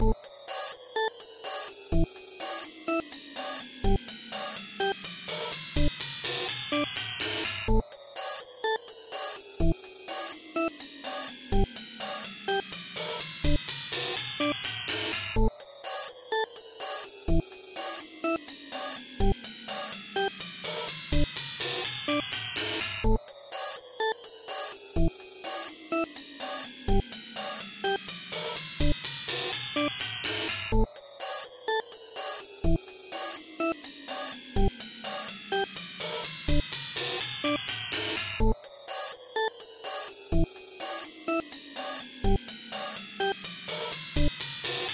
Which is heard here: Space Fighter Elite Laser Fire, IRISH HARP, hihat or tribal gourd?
hihat